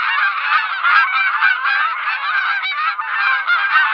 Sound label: Flying Geese; Rally Xtreme; Environment